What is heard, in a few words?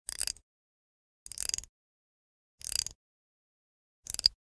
Generic Click Glass Tiny